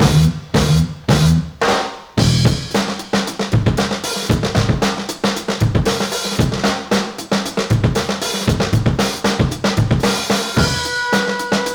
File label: breaks, breakcore